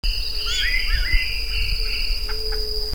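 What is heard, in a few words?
Turdus rufiventris dee wee de woo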